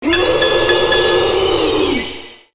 jumpscare; syringeon

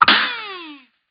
parry slap; cuphead

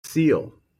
seal

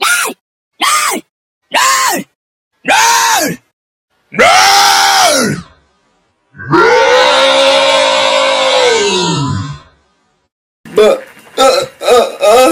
bus

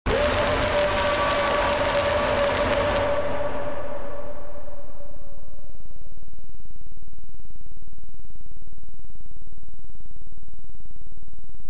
jumpscare male; corruptous

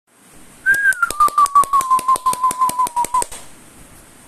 applause

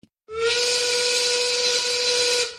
work whistle

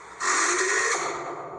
machine pop

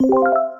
pixel ready sound; reddit; r place

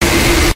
jumpscare sound

